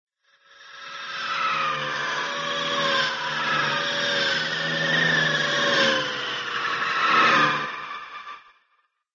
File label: space traffic; cartoon; jetson's; hannah-barbera